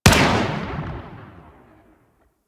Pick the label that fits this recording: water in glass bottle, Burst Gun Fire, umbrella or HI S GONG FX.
Burst Gun Fire